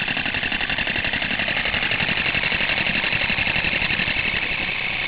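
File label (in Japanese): kutsuwamushi; kutuwamusi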